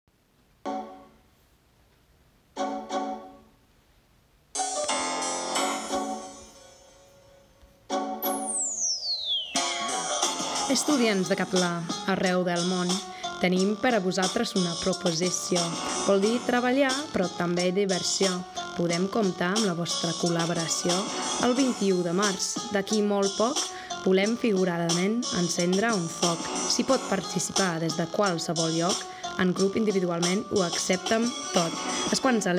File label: rap